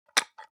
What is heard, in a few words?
object bathroom soap dispenser press squish; smartsoundfx